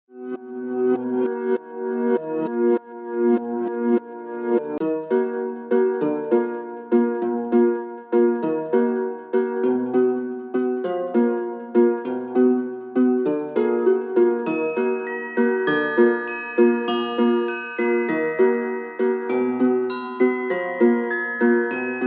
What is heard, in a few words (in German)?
Standby-Modus (Instrumental)